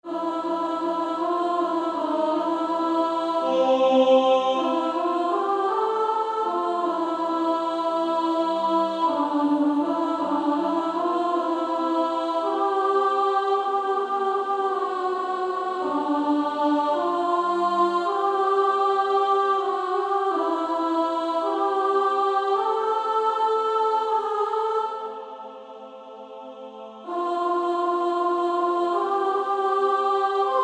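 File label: Female Choir, soprano 2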